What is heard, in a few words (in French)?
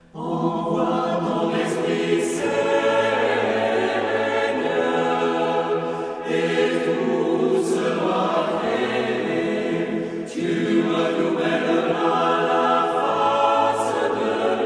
Envoie ton Esprit Seigneur